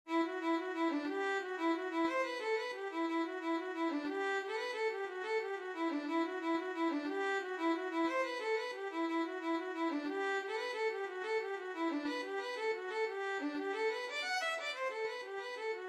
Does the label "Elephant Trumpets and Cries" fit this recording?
No